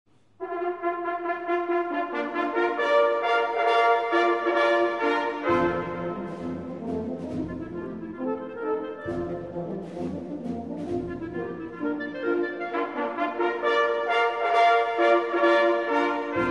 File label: Tannhauser Marcha da Abertura